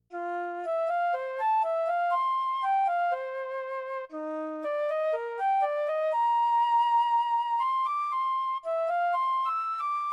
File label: Flute Lyrical